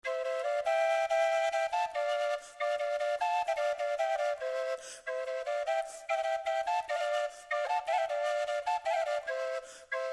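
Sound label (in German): Doppelflöte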